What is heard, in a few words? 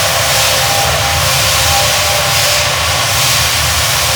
Shipyards Steam Heavy Loop; Ambience; Warframe; Grineer Shipyards